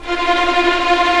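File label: F4 tremolo violins, right; East West; The Ultimate String Collection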